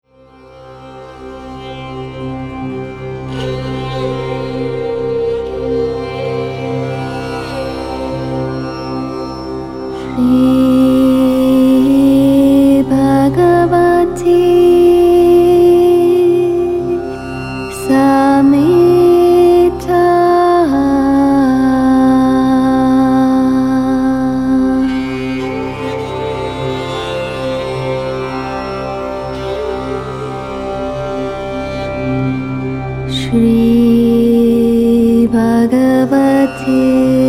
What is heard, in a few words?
Moola Mantra